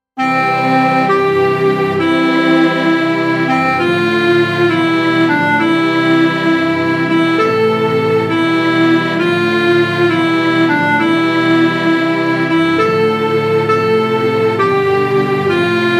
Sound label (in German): herr mein gott keyb